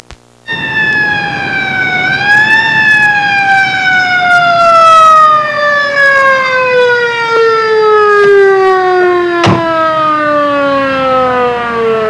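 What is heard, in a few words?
POLICE CAR; trasporti; soccorso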